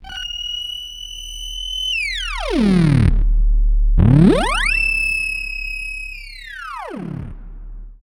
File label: DISTRESS, SYNTHETIC